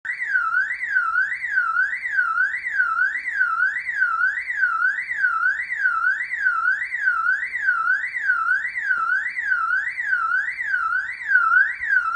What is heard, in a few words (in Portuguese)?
sirene alta potencia